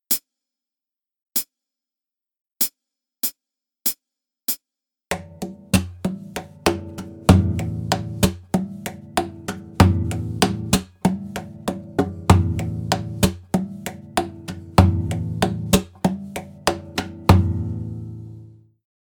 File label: rhythm